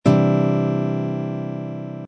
c dominant seventh sharp five flat ninth chord voicing, guitar